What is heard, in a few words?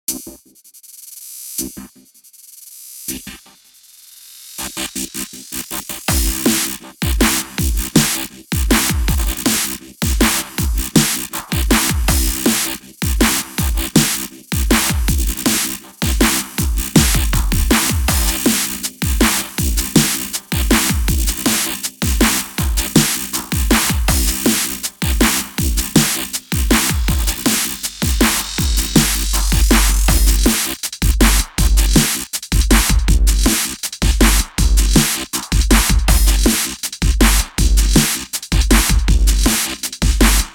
Bass N Drum